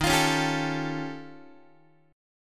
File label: D sharp 9th Flat 5th D sharp, Guitar; strummed; x,11,8,11,11,8; 4A,4E,5A,5D,5G#,6C#; JGuitar; D#9b5 Chord